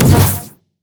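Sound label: concussion grenade; LoL; heimerdinger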